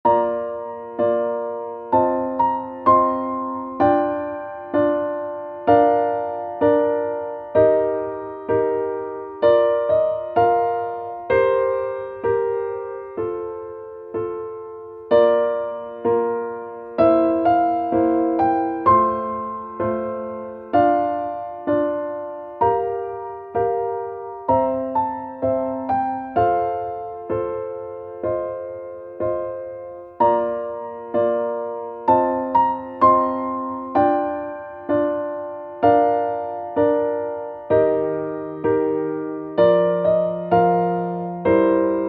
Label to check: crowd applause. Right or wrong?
Wrong